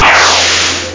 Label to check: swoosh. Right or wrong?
Right